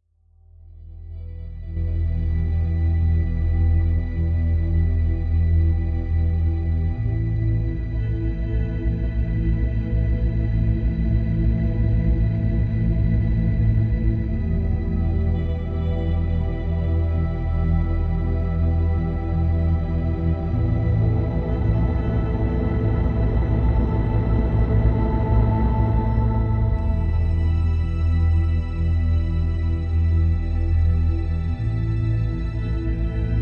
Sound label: ambient foreboding interlude